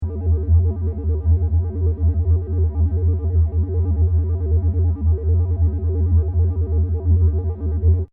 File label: SciFi Scanner, Sci-Fi Scanner Sound Effect; Communication; Perfect for general Science Fiction usage or Multimedia usage; Communications; Crafted Signals